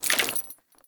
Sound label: Generic Foley; Warframe; Warframes; Dagath